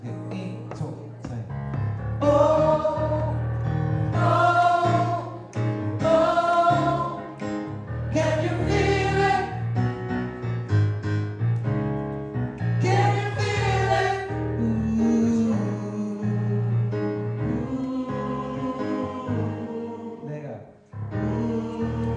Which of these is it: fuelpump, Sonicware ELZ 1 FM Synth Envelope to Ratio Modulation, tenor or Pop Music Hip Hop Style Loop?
tenor